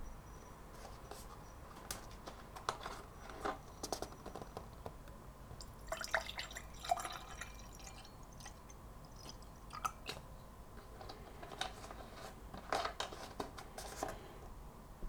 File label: Pouring drink